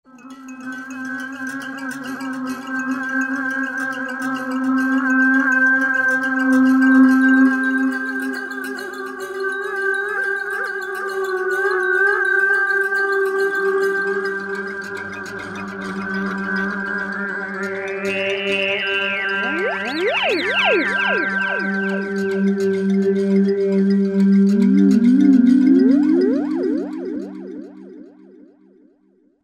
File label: Roland V-Synth African Charm; Factory Patches